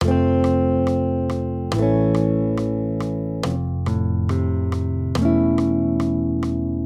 Accompaniment, Major Triads, Root Movement Four Semitones, F-A 140